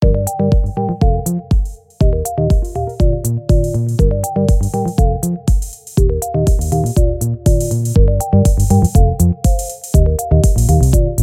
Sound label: Orch Dance